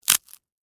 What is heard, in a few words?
Crack Crunch Short, Texture, Crack, Crunch, Short, Ice, Cream, Cone; Food